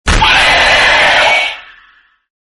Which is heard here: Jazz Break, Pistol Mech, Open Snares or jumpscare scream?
jumpscare scream